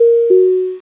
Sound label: flight attendant call button